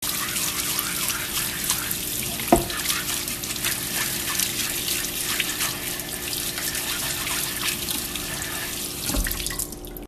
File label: washing cutting board